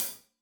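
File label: hat closed, hi-hat cymbals stereo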